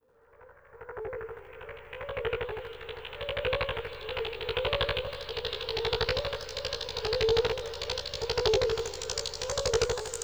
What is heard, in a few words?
ambient Pinger; Xbox